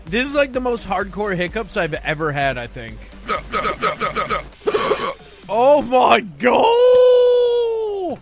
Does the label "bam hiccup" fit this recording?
Yes